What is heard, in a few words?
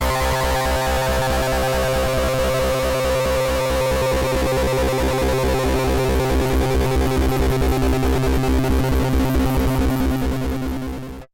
High Voltage